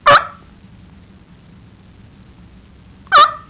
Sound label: cluck, fallturkey; fall turkey 99